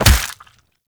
Melee Fist Impact Flesh; Fists

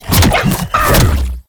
Launch Shroom Cast; Warframe; Nokko; Launch Mushroom

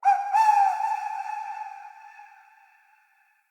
Decoy Flute Call; Powers; Fey; Saboteur

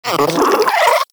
Vocal Captured Idle, Hunting Critters; Nexifera

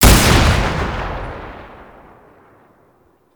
Sniper Shot; Warframe; Frumentarius

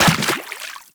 Step Water, Footsteps; Warframe; Teshin

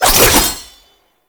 Sickle Spin; Passive; Warframe; Dagath

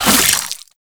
Boomerang Fast Whoosh; Infested

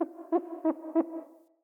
Forest Open Glade Animal Far; Day

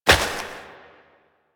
Step Flesh; Warframe; Koumei

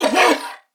Pain Corpus Venus Male, en; Warframe; CorpusVenusMaleB

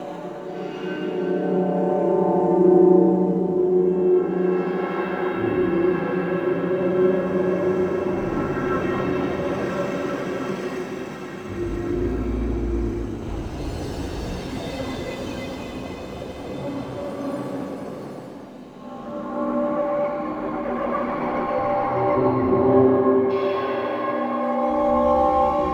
World Seeder Large Bed Loop; Tau; Interior

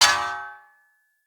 Great Sword Melee Impact Thrown All Surface; Weapon Impacts; Founders Great Sword; Environment Thrown

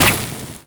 Laser Impact, Weapons

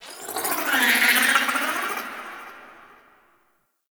Sentient Lair Exterior Creature

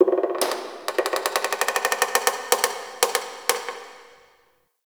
Metal Creak Small, Ambience; Warframe; The New War; Zariman

